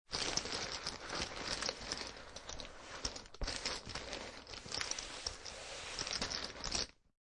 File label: Poker Chips And Cards Moving On Felt; Playing cards and poker chips moving on felt. Stir and blend poker chips and cards on table.